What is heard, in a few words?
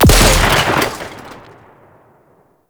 Drone Explosion; Warframe; Venus; Venus Drone Death Explosion